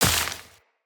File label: Step Veg, Footsteps, Walk; Tenno